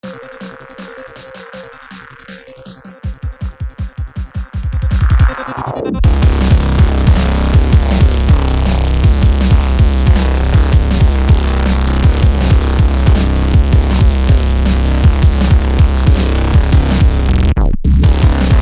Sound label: drum and bass